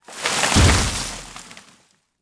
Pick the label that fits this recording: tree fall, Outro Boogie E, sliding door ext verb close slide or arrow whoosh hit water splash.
tree fall